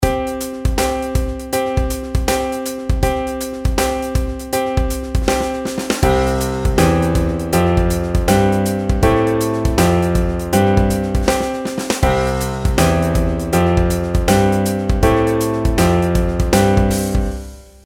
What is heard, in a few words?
pitch patterns skipwise